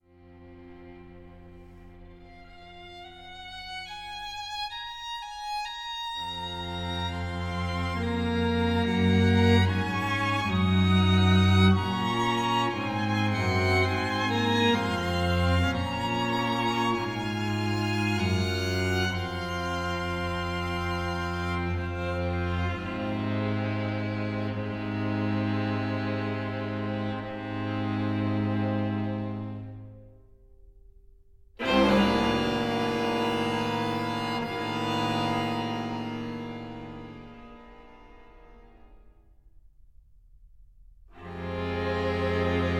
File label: String Quartet; Nordgren Pehr Henrik; String Quartet No 11 Op 144; 3 Lamentations